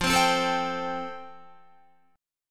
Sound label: F Major Add 9th, Guitar; strummed